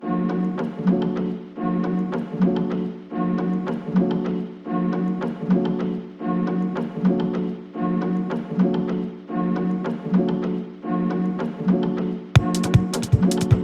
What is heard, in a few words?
Phonk